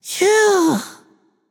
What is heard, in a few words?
ugh, voicepack; smite; jorm